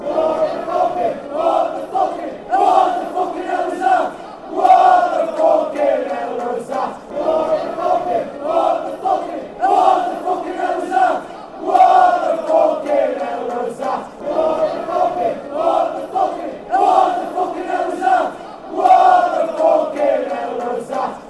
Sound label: what f hell was, fan chants; hufc